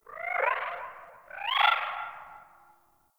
Ostron Village Birds One Off; Warframe; Eidolon Plains; Ambience

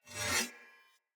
Saw Dagger Whoosh Accent Heavy Long; Weapons; Player; Swords

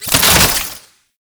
Soldier Zipline Stop; Enemies; Gameplay; Warframe; Lasrian Soldiers

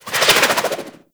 Sundial SMG projectile; Warframe; Tenno; Weapons

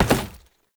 Step Glass, Foley; Warframe; Oraxia

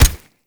Rush Step; Warframe; Runner

